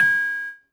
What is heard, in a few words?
piano ab; school; Tony Hawk's Project 8; XBOX; inschool